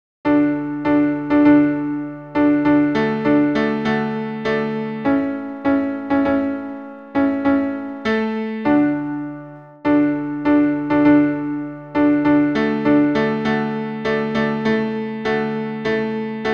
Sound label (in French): ten pian acc